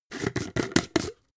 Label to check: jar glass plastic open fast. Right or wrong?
Right